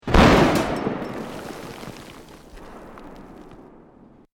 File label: EXPLOSION DETONATION MEDIUM, created explosion effects military style detonation; Bombs